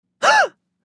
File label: Female surprise gasp